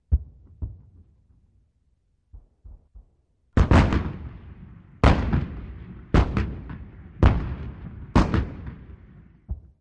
105mm Howitzer: Fires, Close-up, Downrange Explosions. Dual